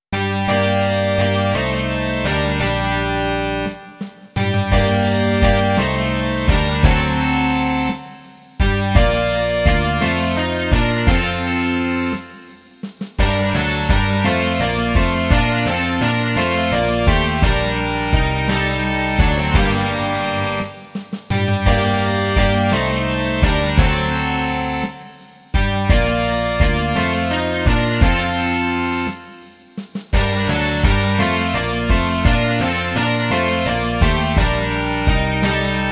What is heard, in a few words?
rock